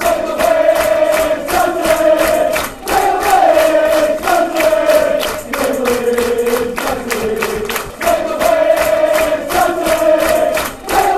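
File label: football chants; craig levein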